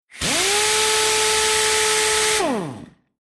Industrial Air Tool Pneumatic Power Wrench Wheel Nut Tightner Mechanical Long Run